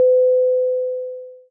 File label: ding